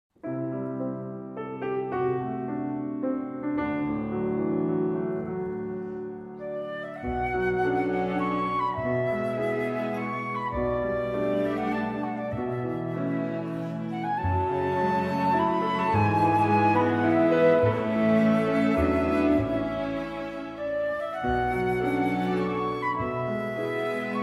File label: Plies; Crepuscule